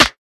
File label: Clap snare, Snare drums